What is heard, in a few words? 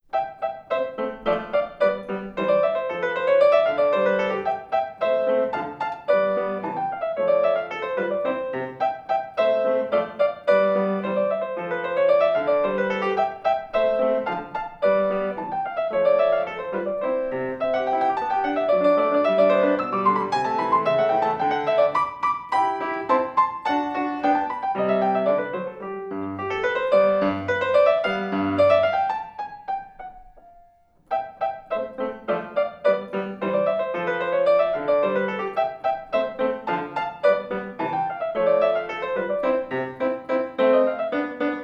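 sonata c major rondo, piano; mozart; k545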